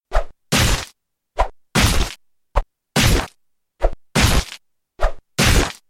attack; Lycan

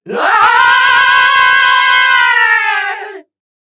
Falling; BombKing; Paladins

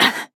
Grunt Attack; Princess

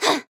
Assassin Grunt Attack

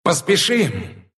move pain, ru; Monkey

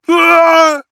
Falling; paladins; Warrior

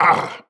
Grunt Hit

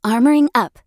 Mage Using Armor Potion; Realm Royale

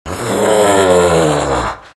moans; Undying

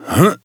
grunt jump; Owl

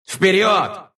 move pain, ru; dota2

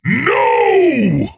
Death; Makoa; Paladins

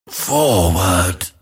move pain; Grimstroke

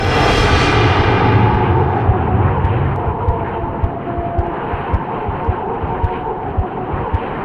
Chain Frost loop; Dota 2; Glare of the Tyrant

